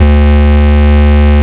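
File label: bass distortion D2; LIMAT LSDJ kit; LSDJ Extra Bass Notes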